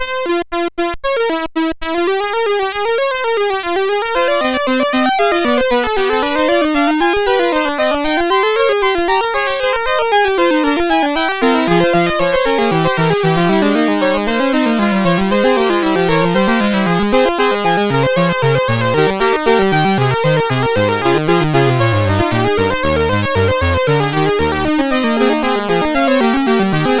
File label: Fugue, sid CSG8580R5